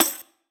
Pandeiro

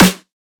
snare

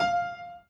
Piano Chord

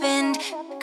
vocal

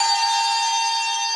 synth main

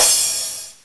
soft hit finish

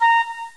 pipe

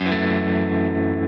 F#m7 Arpeggio down

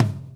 soft hit whistle

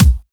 normal hit whistle

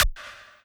lead

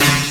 glitch; osu

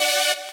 saw

